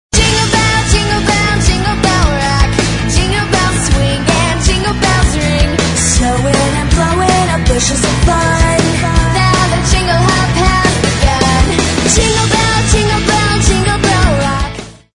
merry heavy metal christmas; tono de llamada

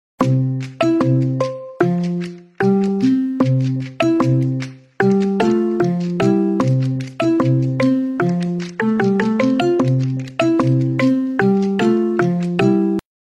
Nokia 9 Pureview Callisto

